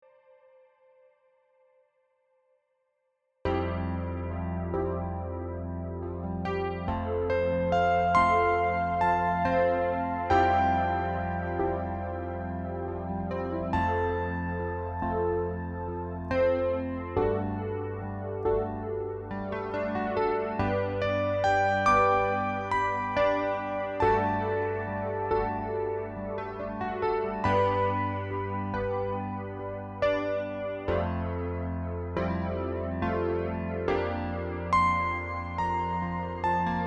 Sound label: Electric Grand; C70P; sampletank4; electromagnetik